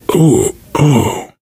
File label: pain relief sigh, survivor voice; source engine; left 4 dead 2